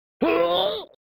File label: Male Damaged